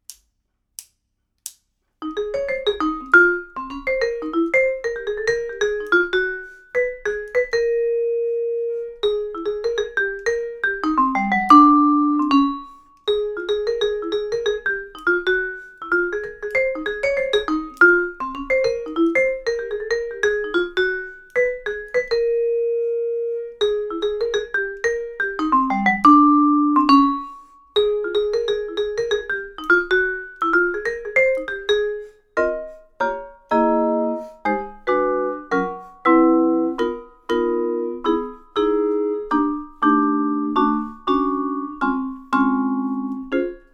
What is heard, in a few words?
chi chi head chords, vibes